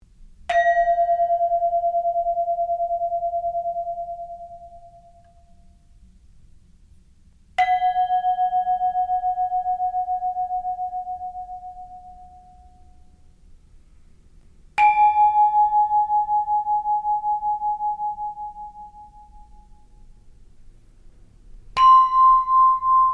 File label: Altos